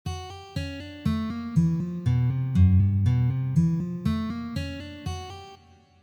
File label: Hammer On